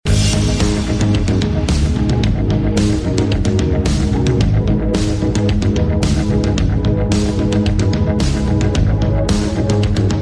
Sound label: Pulse Sft